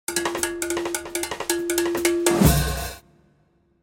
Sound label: Combo